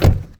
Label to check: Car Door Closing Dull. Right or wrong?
Right